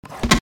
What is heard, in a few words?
flip; abcs; letter m